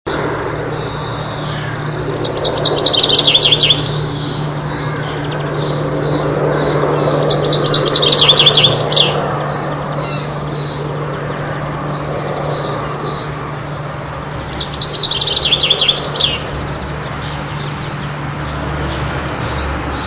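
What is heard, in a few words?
chaffinch song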